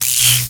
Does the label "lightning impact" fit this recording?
Yes